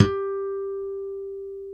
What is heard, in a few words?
G3 HARM, STIK BASS; D-STIK BASS2